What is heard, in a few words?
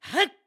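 Jump Attack, voice; BOTW; Breath of the Wild; Link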